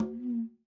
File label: Fast Conga Bend; Sonic Foundry (Sony Creative Software); Joe Vitale Latin Percussion